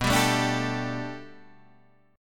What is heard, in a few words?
B Minor 7th Sharp 5th B Guitar DADGAD, chord